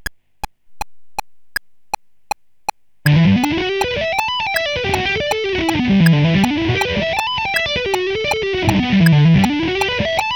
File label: Lick fast